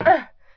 ladder; PC Gamer IT CD 41; Daikatana; mikiko

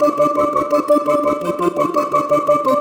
Splinkle D, Pad Loops; Cyclick Samples; Kit One

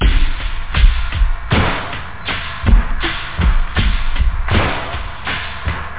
drum; da capo vol1; instruments

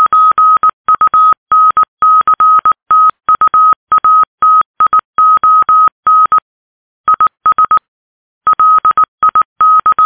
morse

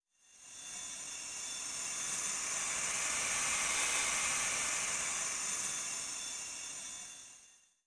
transition; SGI O2 Out of Box Experience 2.2; cyber anatomy

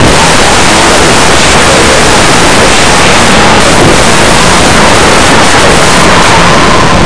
bounce; Blue Heat

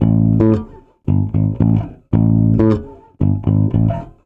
Sneeky Squeaky, Rock Bass; ACID MUSIC

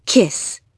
kiss

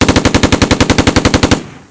MG34; Close Combat

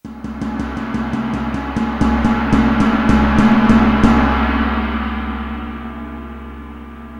gongs

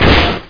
Slam; Hexen II; mezzo

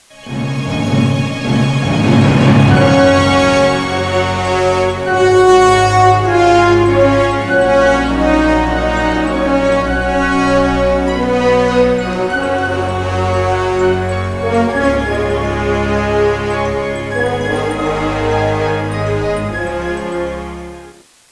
theme; Star Trek Voyager - Limited Edition Entertainment Utility

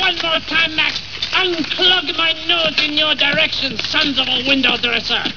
WINDRESS

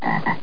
FROGS

